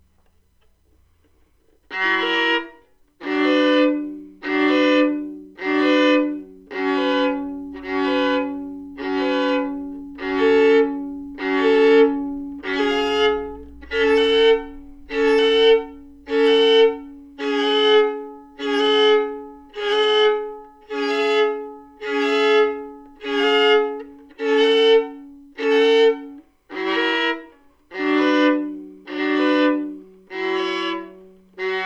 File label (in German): Violin elegie